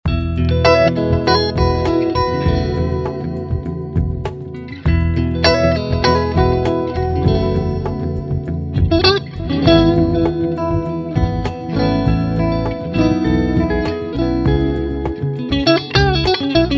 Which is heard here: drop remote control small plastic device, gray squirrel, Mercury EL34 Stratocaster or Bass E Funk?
Mercury EL34 Stratocaster